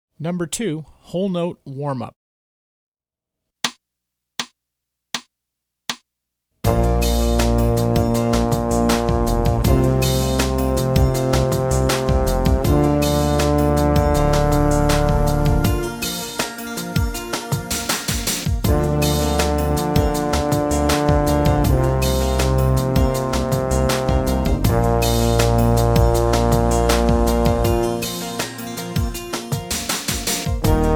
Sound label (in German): Whole Note Warmup Posaune